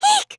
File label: eek; monophanie